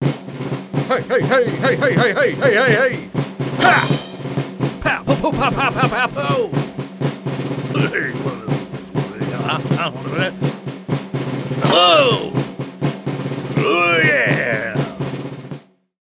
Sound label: prize idle peanut; Ringling Bros. and Barnum & Bailey; Wii